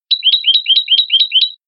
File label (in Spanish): boli